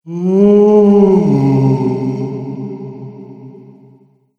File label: Ghost Spooky Moan; Ghost Sound Effects; horror